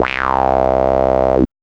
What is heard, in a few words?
ACID SY